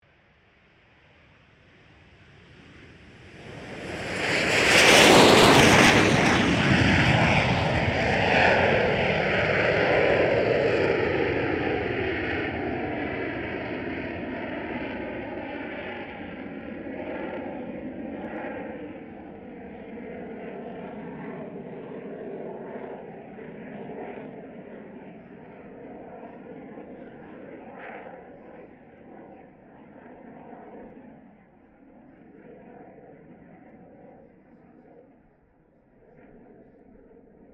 AIRCRAFT CESSNA A-37B DRAGONFLY TAKEOFF, Jet Fighter /Bomber; Airplanes; Vehicles